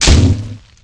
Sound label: bush; Mafie 1